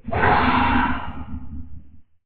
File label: damage; Barotrauma; Barotraumatic; Abyssalcrawler